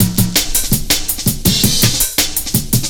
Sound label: circuit break, Drum Bass Drumloops 165bpm; Zero-G; Total Drum Bass